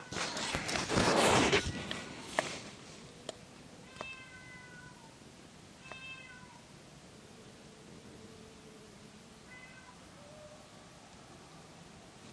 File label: meow